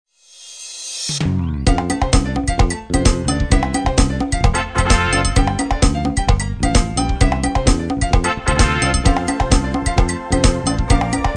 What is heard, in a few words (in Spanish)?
pista jingle